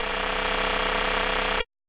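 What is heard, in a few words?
quiz sounds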